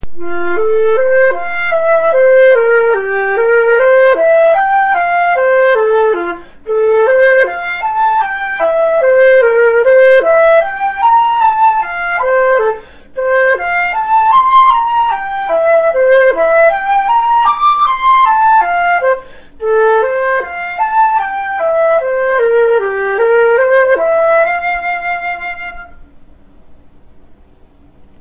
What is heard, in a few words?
slurred, flute; Reichert